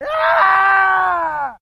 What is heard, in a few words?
Death Screams Male